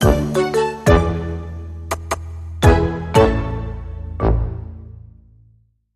RnB Drums

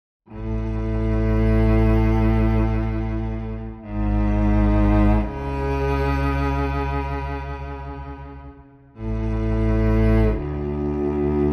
Cello Only

